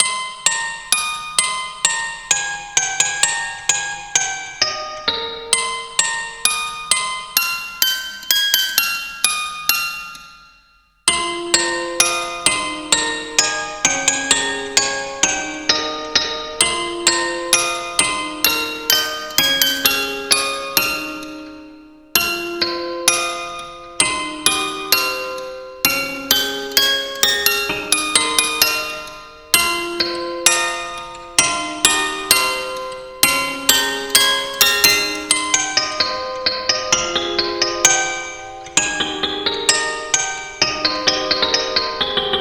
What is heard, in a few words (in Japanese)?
オルゴール アレンジ